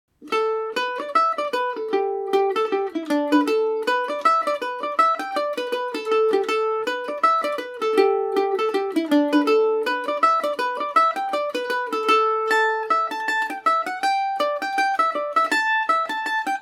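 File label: gatehouse maid a dorian, mandolin; Paris Swing Macaferri mandolin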